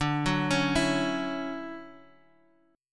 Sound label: D-Major 9th, D, Guitar, Open G